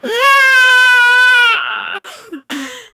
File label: very loud intense crying; emotions